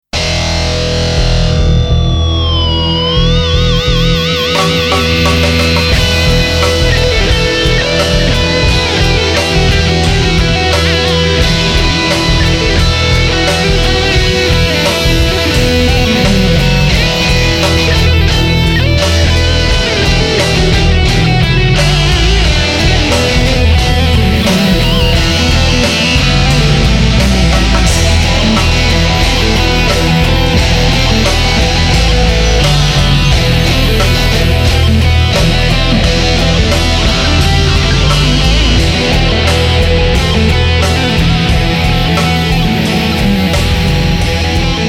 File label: Slayer softsynth